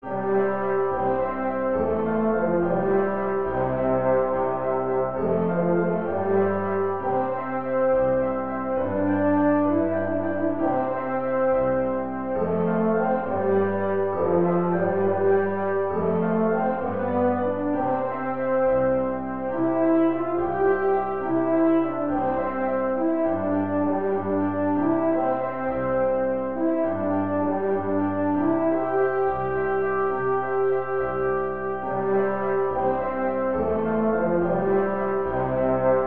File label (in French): Prélude pour cor et piano MIDI